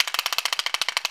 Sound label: Clackers; Planet Earth; Digital Sound Factory Planet Earth